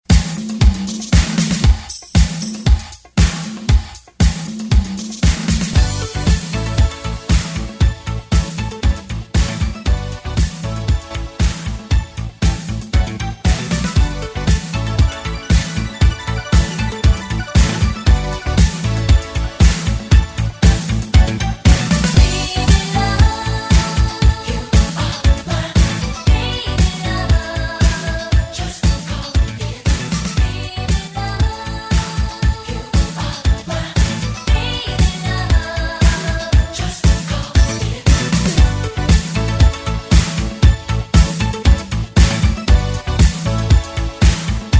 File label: 80s ReDrum; DJ Mhark